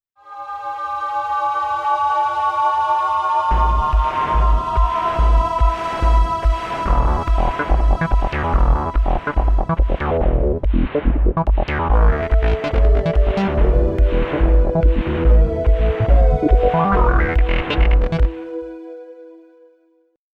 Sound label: vintage synth; 16-bit, 44.1 kHz stereo; xp-80; Multi-patch demo; roland; roland demo cd-3